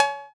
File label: Tr808 cowbell